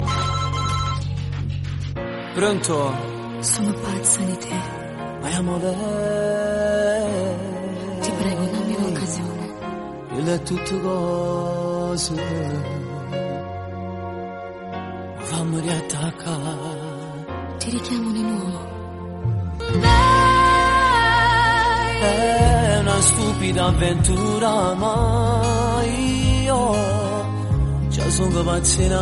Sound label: italian song